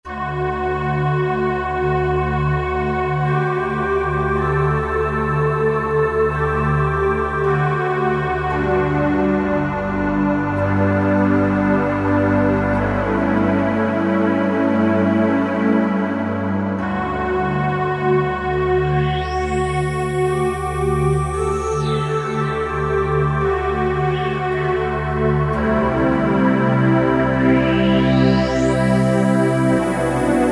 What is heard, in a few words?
Deep Breath Pad, Roland JD-990; Factory Patches